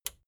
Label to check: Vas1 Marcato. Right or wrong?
Wrong